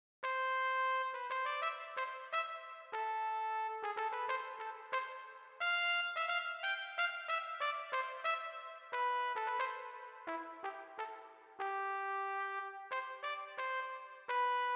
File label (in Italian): tromba